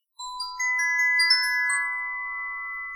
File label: denoising glock 20dB